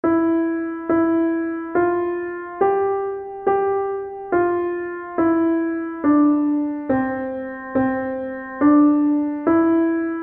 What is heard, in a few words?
ode, keyboard